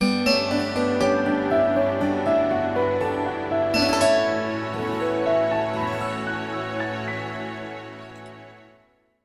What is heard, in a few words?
Time check, music beds, affects and jingles